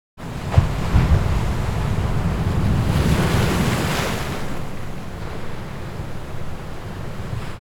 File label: Large Wave Splash on Rocks, The Black Sea; Chris Skyes